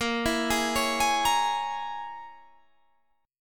A sharp Minor 7th Flat 5th A sharp, Guitar Standard; 18,19,18,18,21,18; A#m7b5 arpeggiated; capo=3